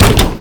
Rivet Fire Center

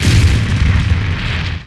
Med Explosion

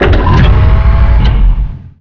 Reverse Thrust

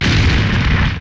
Death Int

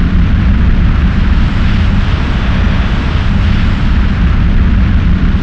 Laser Exp Int

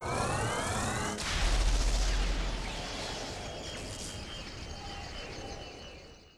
Translocation

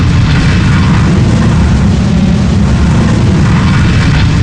Laser Explosion

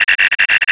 sensor; firestorm; Gameleap; mw4

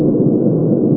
Collision

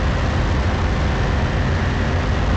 Engine Accel

